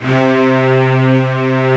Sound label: STR SEC C2, STRING SEC